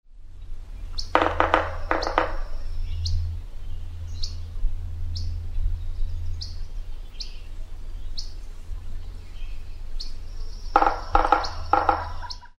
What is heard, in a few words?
yellow bellied sapsucker